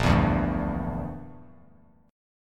Bb 6th Flat 5th Bb, Bass Standard; Bb6b5 strummed

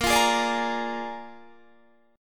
A sharp 7th A sharp, Guitar

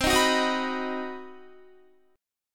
C Minor Major 7th Flat 5th chord, Guitar; strummed; CmM7b5

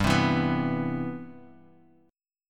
C Major 7th

